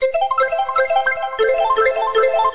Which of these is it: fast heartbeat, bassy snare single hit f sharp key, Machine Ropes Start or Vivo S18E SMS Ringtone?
Vivo S18E SMS Ringtone